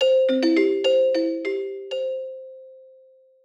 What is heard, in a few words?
incoming Call